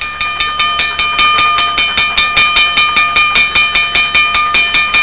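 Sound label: railroad bell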